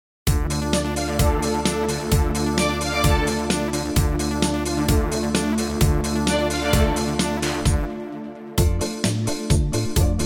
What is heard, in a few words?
minus brass